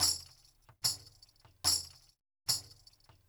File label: PANDERO; Spectrasonics; Liquid Grooves 1 & 2; TAMB+TRIANGL